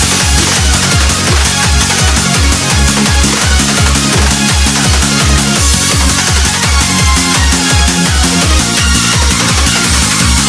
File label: eurobeat